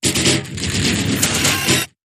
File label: Glitch Metallic Static